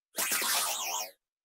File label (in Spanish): Grito de Silicobra